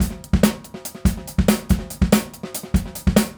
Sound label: Straight Kit, World Pop Beats